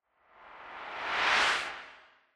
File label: Camera Motion Low Reverb; シーン・カメラモーション; カメラモーション04-5(低 リバーブ)